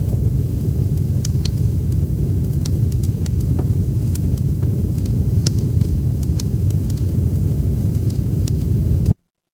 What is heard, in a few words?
ambience fireplace